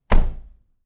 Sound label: car door close; transportation